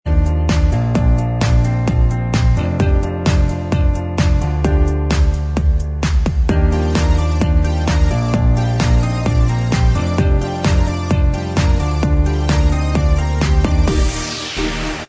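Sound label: instrumental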